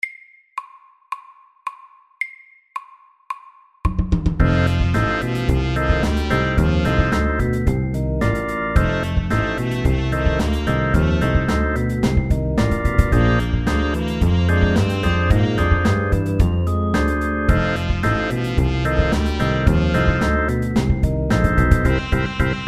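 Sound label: 12 bar blues, medium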